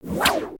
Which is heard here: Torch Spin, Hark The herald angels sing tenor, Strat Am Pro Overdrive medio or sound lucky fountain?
Torch Spin